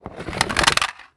mice wireless pour from the box; Genius; NS Studios - ultra sound collection

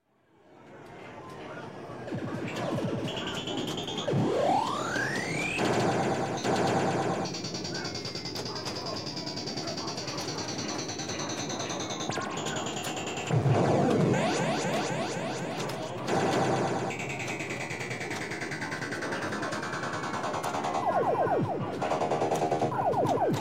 Arcade, Video Game Close Up On One Game, Others; Sound Ideas 2000 Series

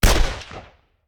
Machine Gun; Sound Ideas; Guns

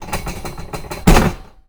Metal Cart Rattle Hit; Foley - Rattles

